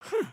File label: curious, emotes